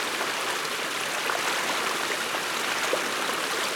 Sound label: Galleon Water Spill Loop; Ambience; Warframe; Grineer Galleon